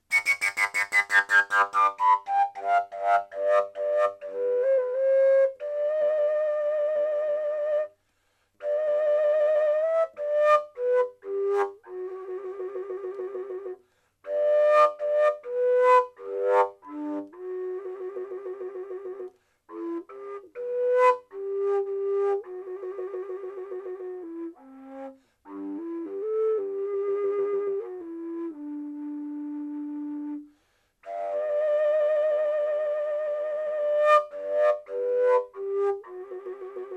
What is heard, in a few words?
collapsible g, fujara